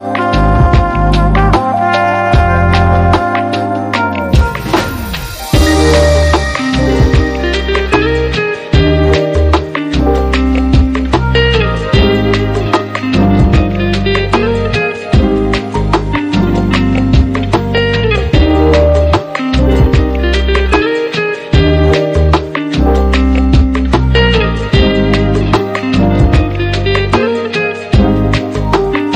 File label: Blissed Beat